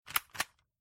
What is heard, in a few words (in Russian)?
validator biletov